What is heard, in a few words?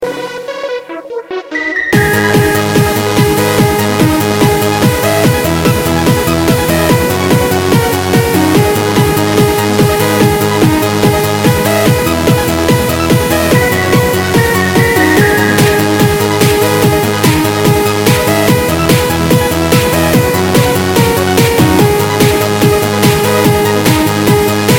trance bass